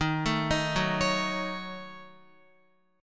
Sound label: D sharp Minor 7th Flat 5th D sharp down Guitar; arpeggiated